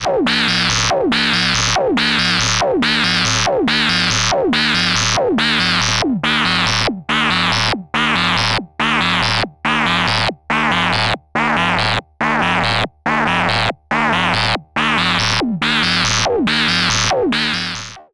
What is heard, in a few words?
Erica Synths Pico System 3 Reso Overshoot